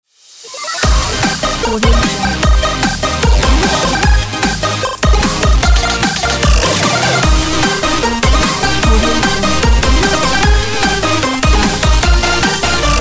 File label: 8bit dubstep win logo